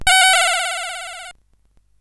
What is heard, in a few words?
sid CSG8580R5